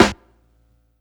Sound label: huge acoustic snare sound g key, snare sound tuned to the G note